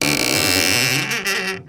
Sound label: opening old wooden door